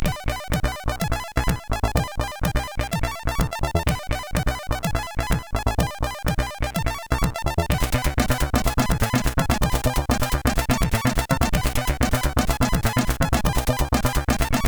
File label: Hardcore sid CSG8580R5